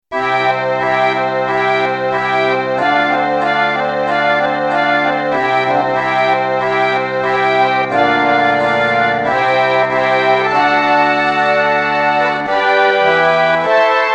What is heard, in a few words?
American Pump Organ